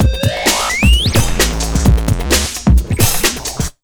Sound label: JUMP 130, Big Beat Drumloops